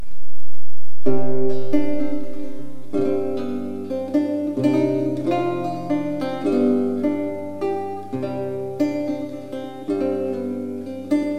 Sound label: menuet